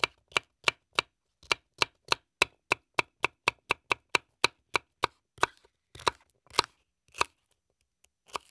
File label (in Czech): krajeni; Mafie 1